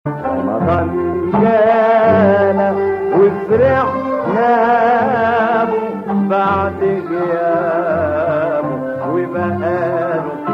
ramadan song ringtone

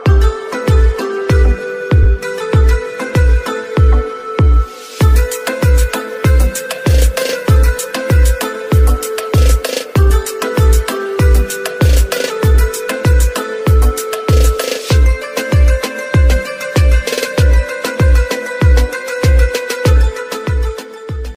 romantic ringtone, bollywood ringtones

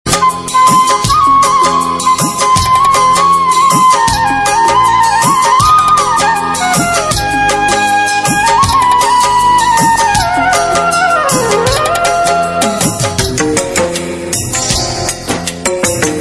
hindi instrumental ringtone; bollywood

